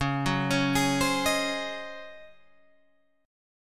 C sharp Major 7th Suspended 2nd Suspended 4th Guitar Open G